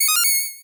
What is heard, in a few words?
8bit se